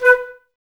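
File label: flute, Woodwinds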